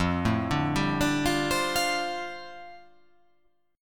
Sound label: F 6th Add 9th F down, chord